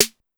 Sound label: Snare Electronic Hi, Pitched Up; Clavia DDrum-AT